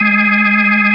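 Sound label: B3 G#3, organ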